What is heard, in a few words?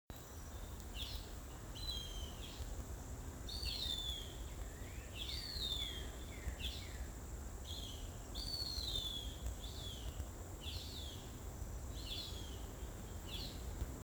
Broad winged Hawk